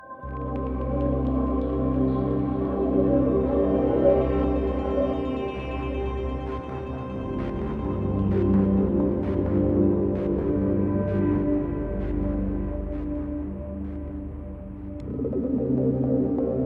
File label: Ambient